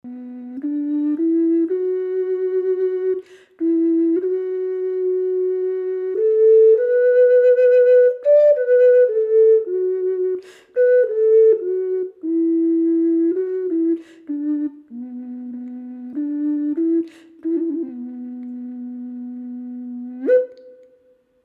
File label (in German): Indianisch Bass H, ocarina; Indianische Ocarina